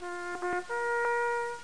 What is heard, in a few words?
Lose